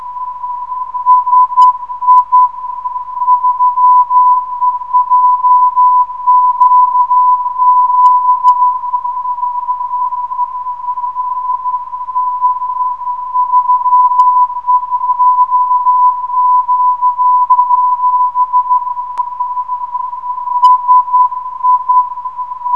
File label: 410.4kHz, ndb